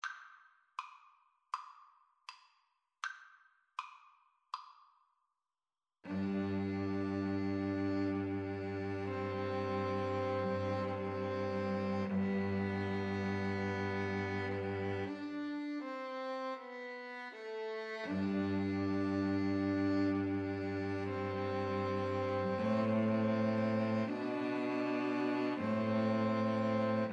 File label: 2 Violins Cello